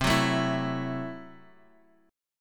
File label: C 6th Flat 5th C down